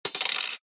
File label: industrial spring small down on surface